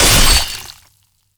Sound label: katana slice, samurai